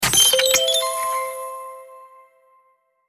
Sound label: padlock unlocked